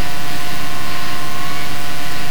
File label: sound screech